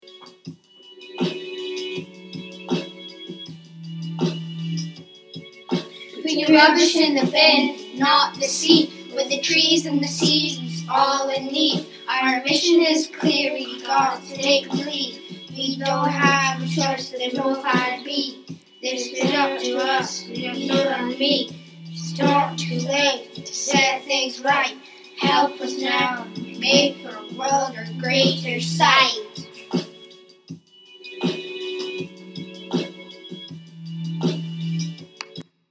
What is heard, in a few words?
Recycle Rap